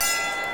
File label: boxing match bell